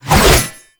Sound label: Bayonet Melee Combo; Warframe; Tenno